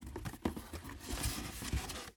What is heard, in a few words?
cardboard